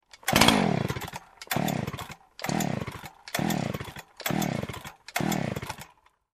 Chainsaw not starting

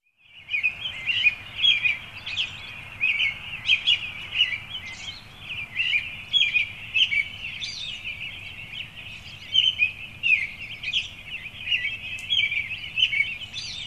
Birds chirping on early morning

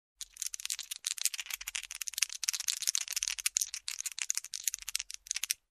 Dice Shuffling

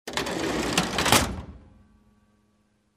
Closing rattling drawer

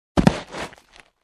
Boxes being piled

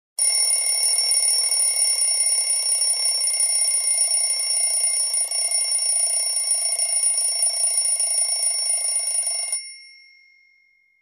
Classic Alarm Clock Bell

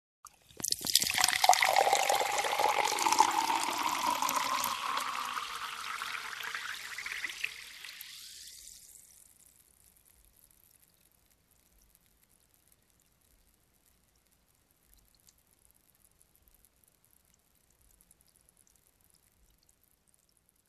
Pouring water in a glass

